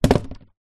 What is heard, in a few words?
polystyrene expanded block place down on ground; foley